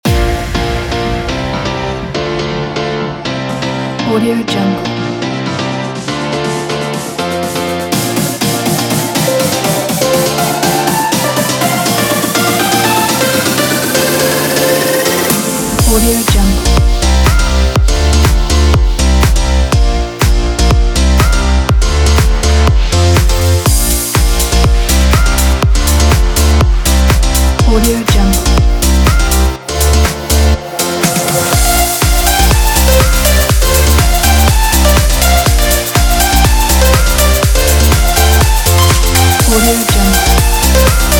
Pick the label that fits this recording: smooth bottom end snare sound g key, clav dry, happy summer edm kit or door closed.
happy summer edm kit